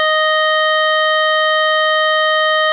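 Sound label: string D#3; fleet keys Samples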